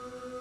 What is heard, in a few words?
spin, slots